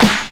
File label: Pre-Shifted Claps; Vengeance Samples